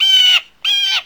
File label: blue jay; WAR